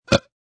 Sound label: Short Burp